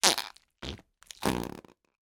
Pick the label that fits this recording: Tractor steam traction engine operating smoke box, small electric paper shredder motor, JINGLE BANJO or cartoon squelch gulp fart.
cartoon squelch gulp fart